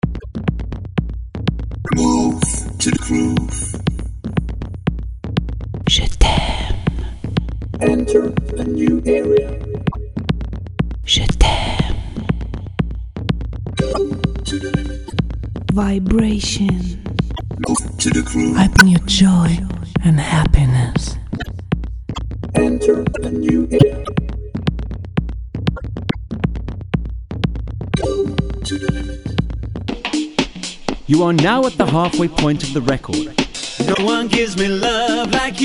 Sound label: Vocal Attack; WRE Bundle